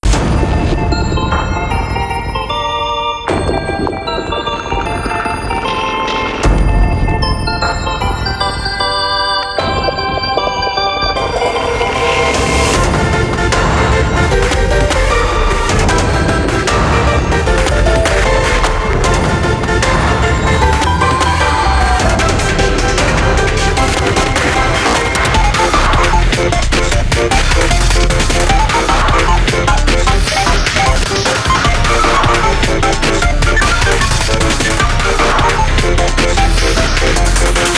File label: chase; tf; slender; kfxmas